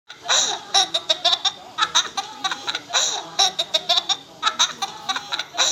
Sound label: Child laugh